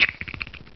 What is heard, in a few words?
Jowls